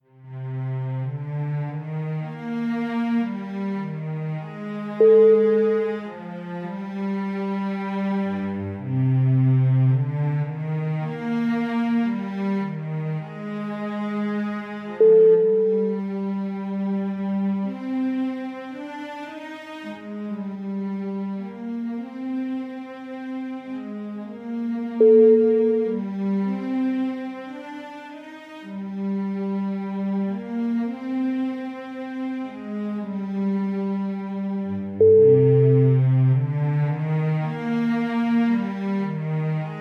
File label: royal york cello